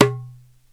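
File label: perc darabuka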